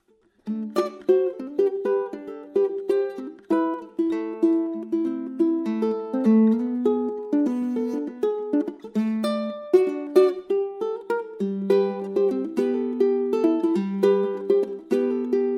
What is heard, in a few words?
uke tenor